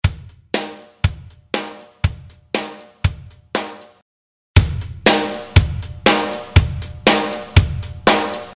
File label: loop